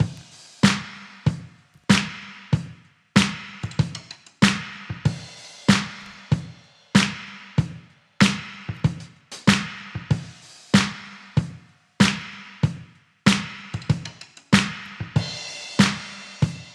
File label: 95 bpm breakbeat e key, Free breakbeat sample - kick tuned to the E note. Loudest frequency: 1482Hz